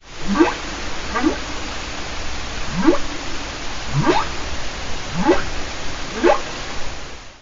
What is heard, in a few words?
whale call